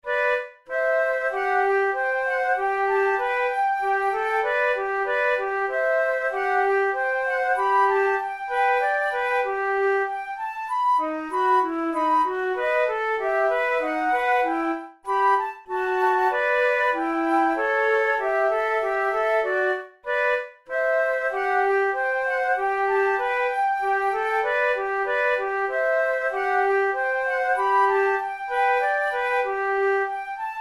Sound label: methode de flute; tulou